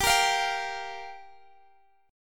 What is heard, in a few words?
G Minor 7th, Guitar, Open G